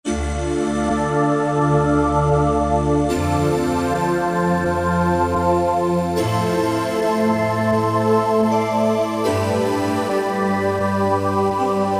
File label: Voc, E-mu Morpheus; AglHeart